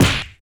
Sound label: Face Punch; Afterbern Democralypse; Windows